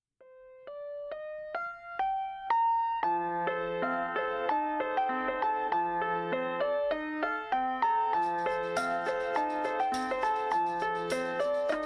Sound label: instrumental